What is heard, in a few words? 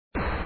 boom player; tankz; InfernalGames